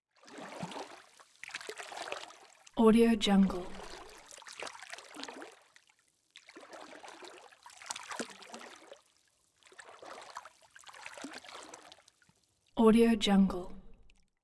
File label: walking in deep water